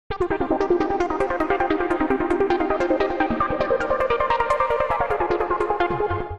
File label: C Minor 150 bpm, synth; MIDI Clips; Tonal; A Hornet Pillow; Ableton; Skitter and Step